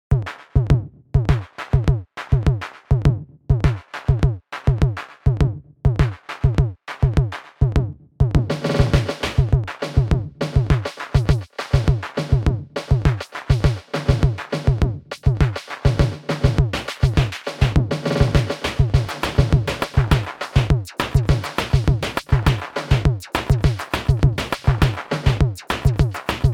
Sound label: samba reggae; StiX